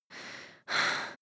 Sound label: breath female out of puff